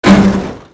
vehicle landing clank; golgotha

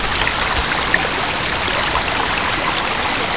creek; environment; water; mono

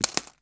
coins bag

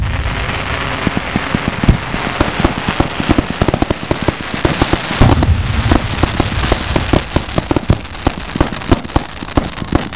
popping, explosion; sasquatch

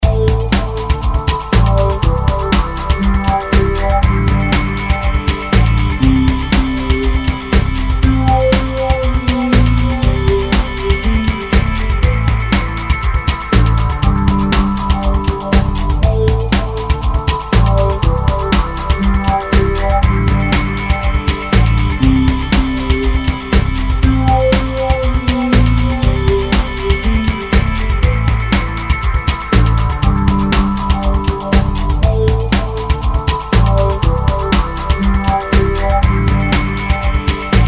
slow and easy; music; stereo

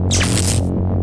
electric car charged; golgotha

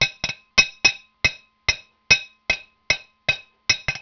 blacksmith working, hammer

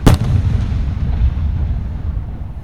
boom, explosion; sasquatch

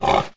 snort, pig; creatures; quadruped

